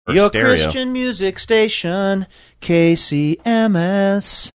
singing your christian music station

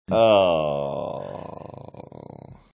long drawn out ohh

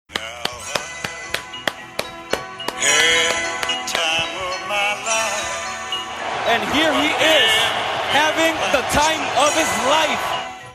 clapping playing

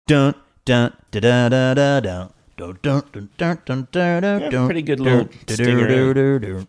singing intro

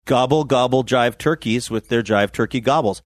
gobble gobble; jive turkeys

